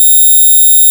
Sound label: Bagpipe Wave Bb5